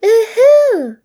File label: "Woohoo"